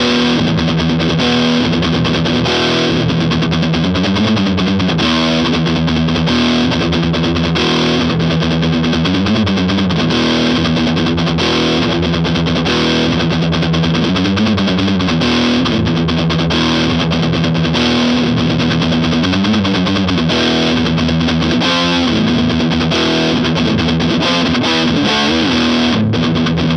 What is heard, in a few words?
Active Mode Neck; ESP Ben Weinman